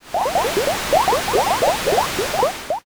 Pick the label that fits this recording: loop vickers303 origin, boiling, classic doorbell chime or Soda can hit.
boiling